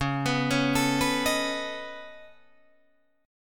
C sharp 7th Suspended 2nd Sharp 5th C sharp down Guitar Drop D